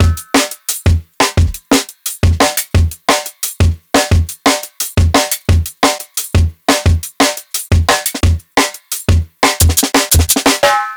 Live Jungle Drum Break, 175; JungleBreaks24

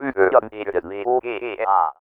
VOICE FX, Dance Vocals

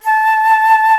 flute A3, ebony flute; InVision Interactive; Lightware VOL-1; Instruments & Percussions

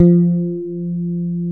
F-3, FRANGE BASS; AKAI S-Series CD-ROM Sound Library VOL-7

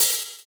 Open Hhat, Hihat; Equipped Music; Loungin House

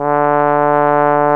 Solo Brass, Tuba

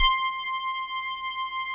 FM bass C6; Greytsounds; Scott Peers Sound Engineering

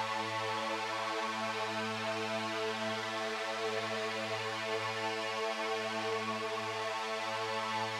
Drone; stereo toolkit samples; Non Tempo Loops

